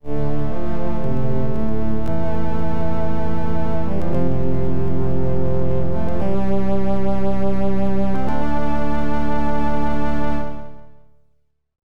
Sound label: Game Over Solo, soundtrack; Tyrian